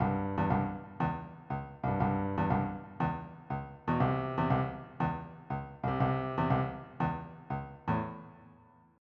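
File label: shuffle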